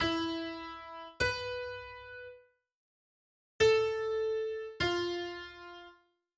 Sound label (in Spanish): intervalo meldico